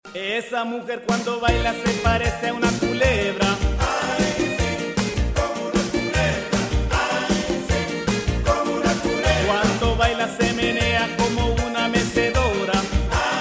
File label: latino swing; ringtone